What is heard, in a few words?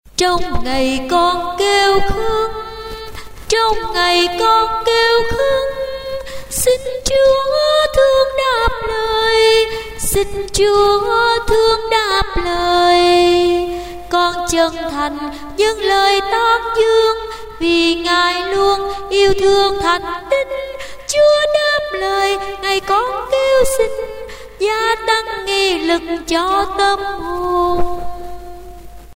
sop alto